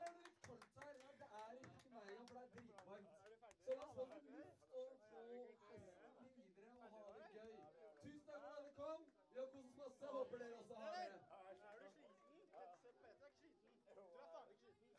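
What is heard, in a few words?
guitar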